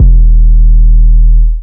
808s; OVERUSED Redd808

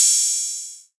Open Hat

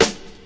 SNR, Snares

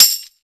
Tambo; Percs; Plugg Tambo

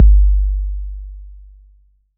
808's

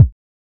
kicks

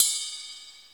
Percs

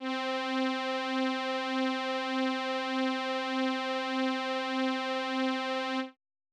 Chords, Keys

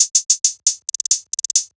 hihat fill 135; Closed Hats

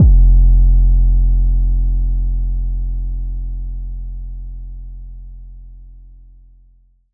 808 F; Cymatics

